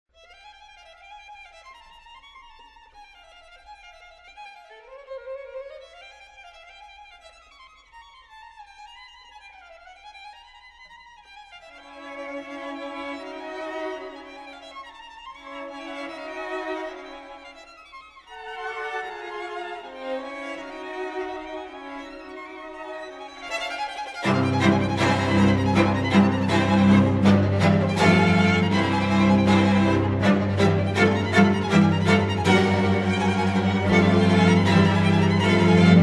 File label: String Quartet No 11, in F Minor, Op. 122; Dmitri Shostakovich; Complete String Quartets